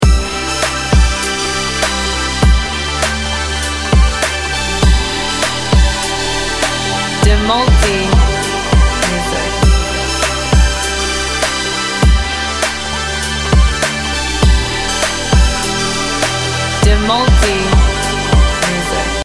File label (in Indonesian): instrumental edm, Bass berat dan ritme berulang menjaga energi